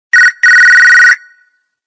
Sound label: Ring; Coolpad 5010